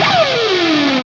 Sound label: Pick Scrape; Roland BK-5